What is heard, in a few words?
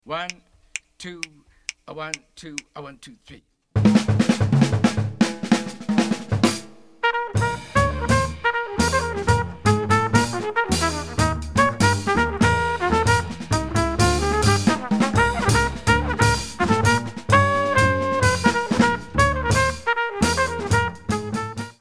uptempo minus Piano